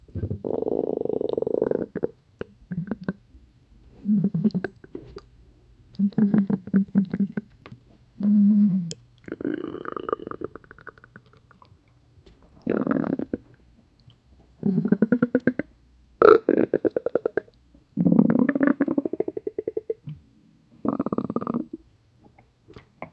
womans distinct rhythmic, body

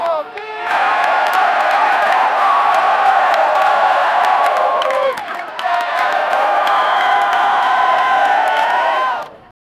energetic cheerleaders ch, cheering

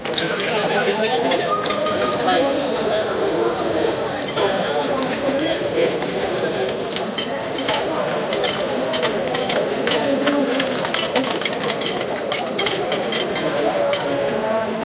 the crowd murmurs in, courtroom

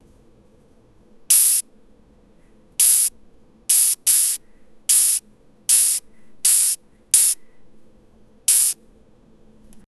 wearing hat, clothing

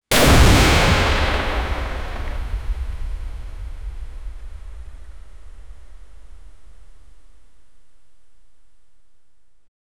dynamic close up shot a d, nuclear blast

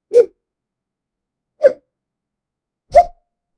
short satisfyi, gameplay

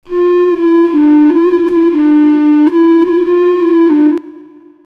a rb flute smoothly fades

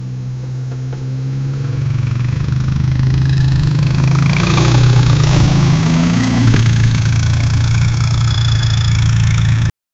video game motor cycle

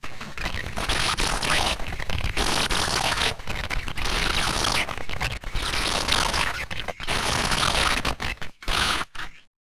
bear movement glitching

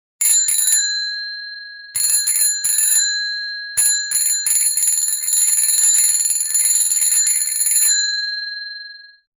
hit the bycycle bell soun; bicycle

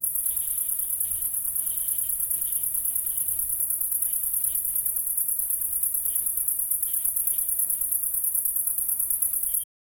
cricket chirping in summe; summer

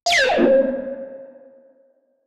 electronics

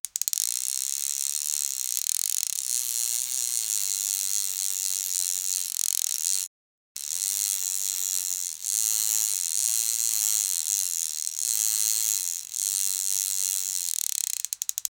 medium fast camara sutter

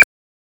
futuristic cyberpunk button press

